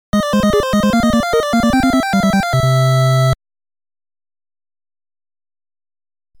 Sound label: Level Complete; Knight Rider; NES